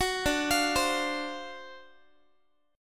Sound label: D 7th Sharp 9th D down Guitar; D7#9 arpeggiated; 21,22,20,22,x,x; tuning 4A,4E,5A,5D,5G#,6C#